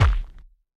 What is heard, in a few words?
Bounce Warhammer